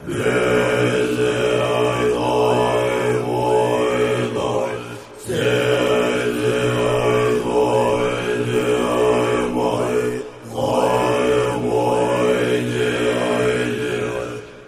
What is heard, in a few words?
Monk Voices Low Chant, choirs & chants